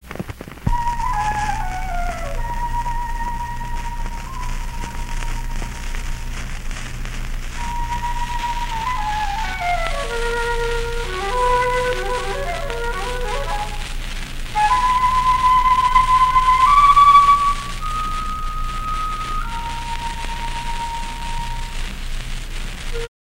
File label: Syrinx; Barrere; bars 1-8